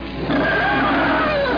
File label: creature asterisk